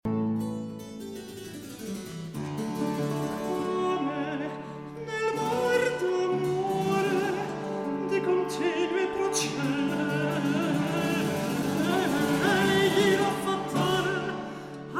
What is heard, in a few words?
Recitativo; FONS MUSICAE; Steffani